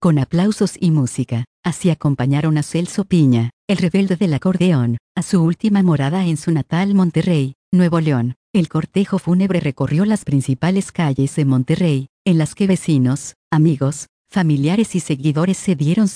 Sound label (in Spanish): Con aplausos y música; Así acompañaron a Celso